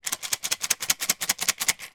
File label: leisure game pick up sticks in box shake